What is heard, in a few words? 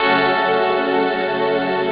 synth